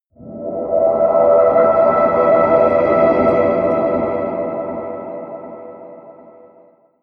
air wind choir; drones

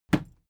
fight impact sweetener cloth; krieg-waffen; nahkampf; soundpool

